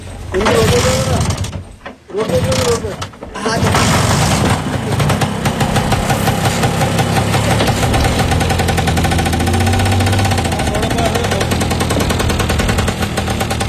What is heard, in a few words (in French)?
Voix d'ailleurs musique